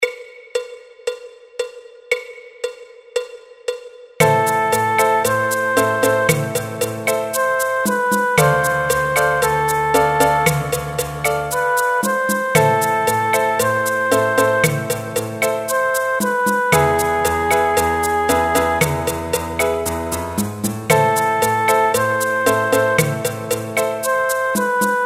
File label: Latin Groove Backing Track Piano and Percussion; Latin Groove Melody, percussion and piano